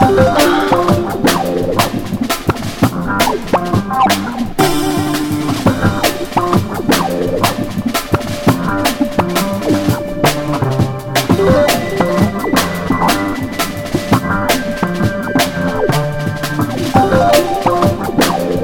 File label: bass